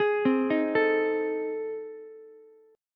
A Minor Major 7th Flat 5th A, Ukulele; AmM7b5 arpeggiated; 13,12,11,12

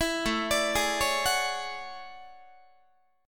A Major 13th Guitar; AM13 arpeggiated

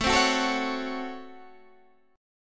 C sharp Major 7th Suspended 4th Sharp 5th A; strummed

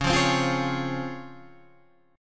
C sharp Major 7th C sharp

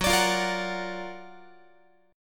F sharp Augmented Major 7th F sharp Guitar; strummed; F#+M7; 4A,4E,5A,5D,5G#,6C#

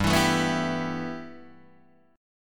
G 6th Add 9th, Guitar, Open D

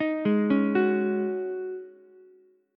Ab 7th Suspended 4th Ab Ukulele; Ab7sus4; 8,8,9,9; arpeggiated; chordshape; JGuitar

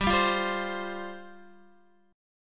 G 7th Suspended 4th G down Guitar

